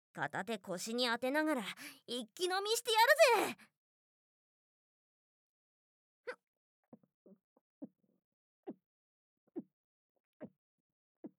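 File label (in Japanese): ザーメンゲップ（2連発！）; ザーメンゲップ集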